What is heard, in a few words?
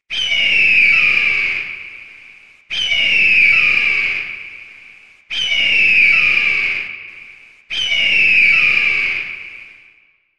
Eagle Sound